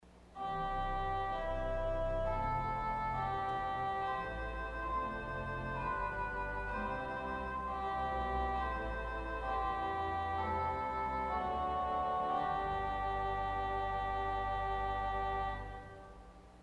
Eng Horn Solo, organ stops; Culver Academy

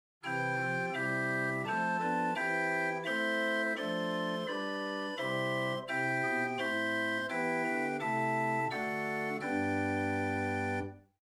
Gedackt Rohrflote, organ; Ott Chamber Organ

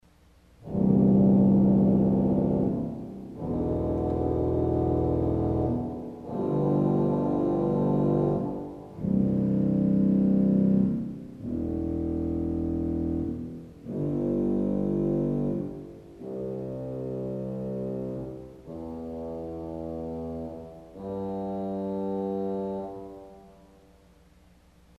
Voix del Abime Arpeg, organ stops; CulverAcademy

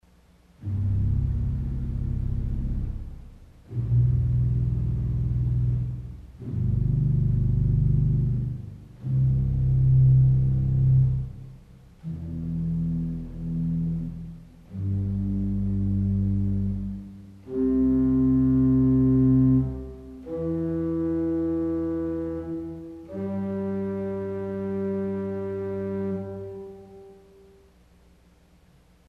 De Profundis Arpeg With 16 and 8 stops, organ; CulverAcademy

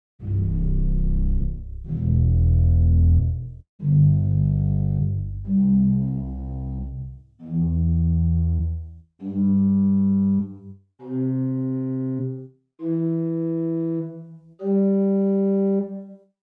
Ped Principal16 arp, organstops

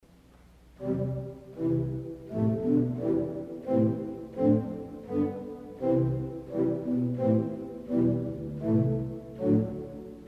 Pizzicato St Anne, organ stop; CulverAcademy

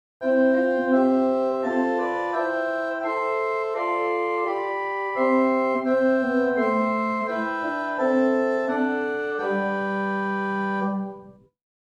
Gt Octave4, organstops; First Baptist Riverside

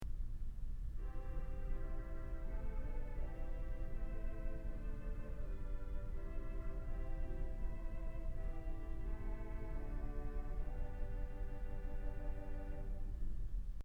Sw Vox Humana, organ; Kellogg Auditorium

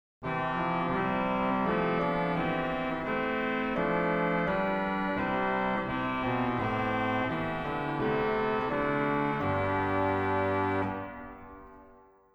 Trompette; CavColMainz

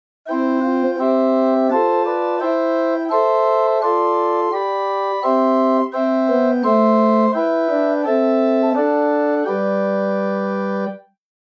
Prestant 4, organ; Buzard

